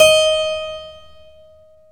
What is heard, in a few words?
KEY HRPCR, Roland; Roland - LA Composer vol. 1